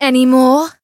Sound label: damaged; toova